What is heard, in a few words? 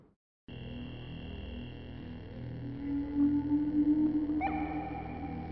Eerie Noises